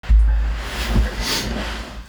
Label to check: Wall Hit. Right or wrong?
Right